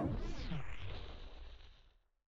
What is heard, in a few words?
swapper hit character; The Swapper